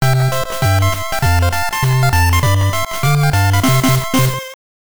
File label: Level Complete; Gremlins 2 The New Batch; gameboy